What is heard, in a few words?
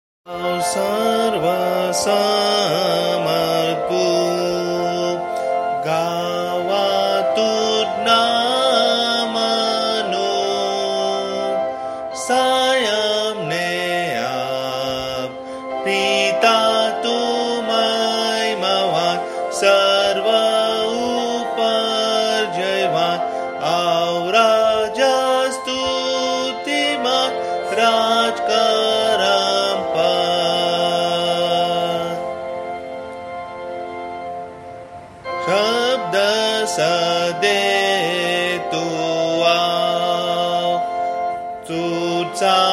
Sung; Italian Hymn ( MOSCOW ); Come Thou Almighty King; Guj Notation